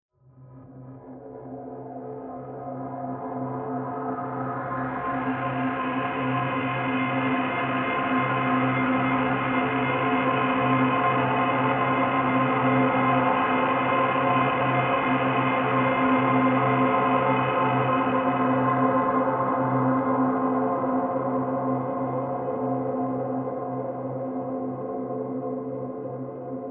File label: Synth